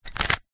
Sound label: leisure rubiks cube single turn